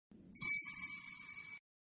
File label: bell notification message; itachi